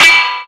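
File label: STEEL, metallic percussion; PERCMETALIC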